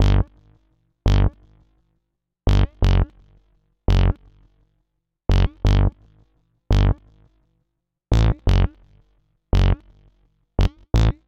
Squiggle Bass B Minor 85 bpm; MIDI Clips; Bassline; Ableton; Factory Packs; Chop and Swing

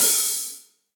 Hihat Open Cold Tight Room; Drum Hits; Ableton; Factory Packs; Session Drums Studio; MPE Hits

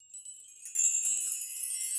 Chimes B FX; Latin Percussion; Drum Hits; Bell; Ableton; Factory Packs

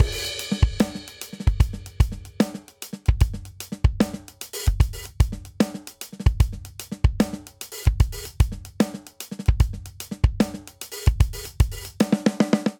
Triplet Hip Hop Verse Variant 75 bpm; 4 4; MIDI Clips; Ableton; Factory Packs; Drum Booth

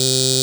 svox, Digital Synths; Ableton Foundation FreePacks

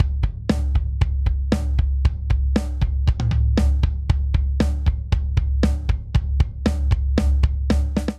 Straight Rock Buildup 117 bpm; 4 4; MIDI Clips; Ableton; Factory Packs; Drum Booth

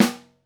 SN1 Overhead, Snare; Ableton Foundation FreePacks; Snare Designer

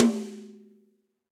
Tom High Bright Room; Drums; Drum Hits; Ableton; Session Drums Studio; MPE

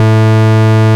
Bass A1; Ableton Foundation FreePacks; Foundation Glitch Toys & LoFi

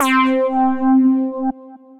Clav, Synth Lead; Melbourne Clav

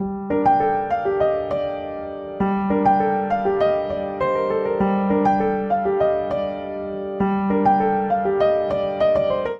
Ostinato Sorrowful G Minor 100 bpm, Upright Piano; Upright Piano by Spitfire Audio; Ableton; Factory Packs

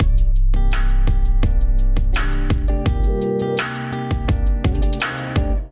Kit groove 84 bpm; Gotta Feel Kit; Ableton; Beat Tools

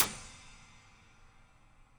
Piano IR pedal down; Convolution; Instruments; IR Samples; NI Kontakt 5

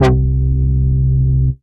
Moug House Bass

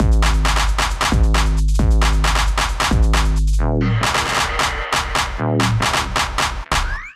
Kit Melodic 134 bpm, Drums; MIDI Clips; BayTonal Kit; Ableton; Factory Packs; Skitter and Step

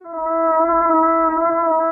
Poly Synth; Play Keys; Ableton; Factory Packs; Inspired by Nature by Dillon Bastan; Emit Presets

